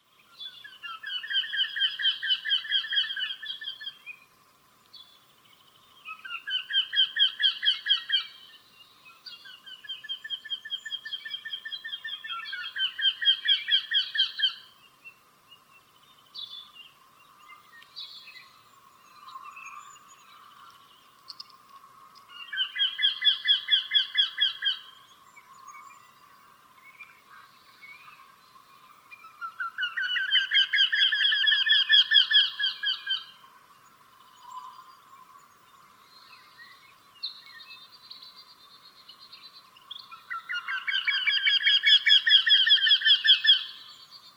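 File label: vertisheyka voice, wryneck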